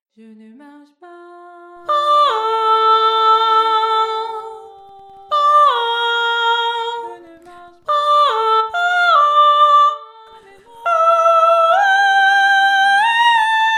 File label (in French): Vocalise voix